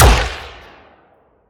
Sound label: Sciweap Shot Small Gun Chaos Colt